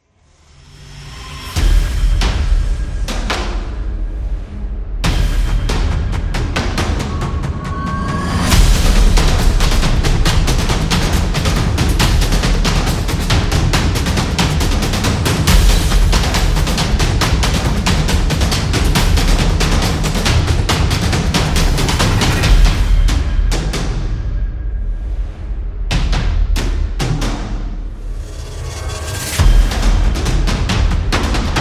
Energetic Drums; percussive nation vol 2

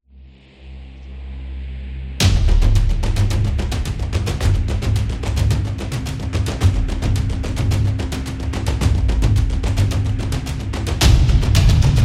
Epic Drums; suspense

